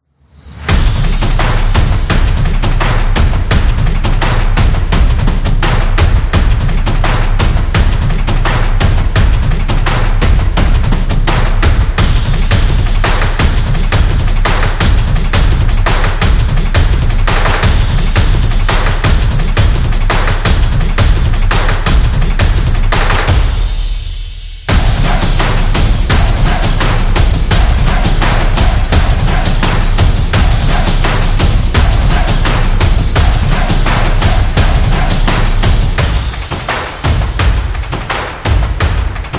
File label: Drums Action; high energy